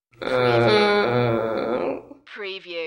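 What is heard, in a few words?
Woman Whine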